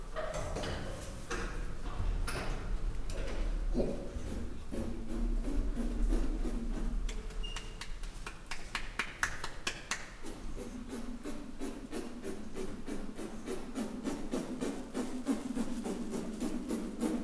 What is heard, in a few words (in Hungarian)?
területjelző hang, csimpánz; pecsi zoo 2017